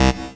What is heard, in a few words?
incorrect, Synths; Notes